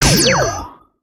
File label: Repulsion Wand Fire; weapons; aronwk collection; ndaudio